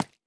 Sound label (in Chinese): 蜡烛 破坏声